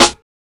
00s subtle reverb acoustic snare sample g sharp key; Mono